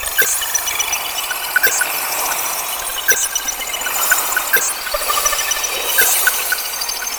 Delivery Key Pickup Loop; Gameplay; Warframe; Nineteeen Ninety Nine; PvPvE

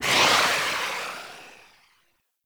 Minigun Tail; Warframe; Corpus

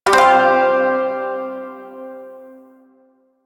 Shawzin Pent Min Chord G; Warframe; Chords

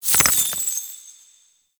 Pickup Shard; Warframe; Cephalon Weave

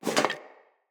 Foley Armour Fill C; Enemy; Mockeries

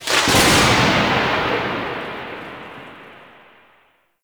Void Storm Thunder Clap; Warframe

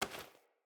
Generic Pickup

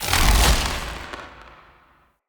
Galvanic Button Power Down

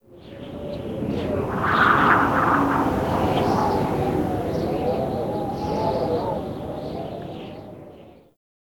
Exterior Wind Gust; Duviri

